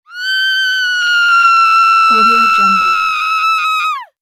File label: scream female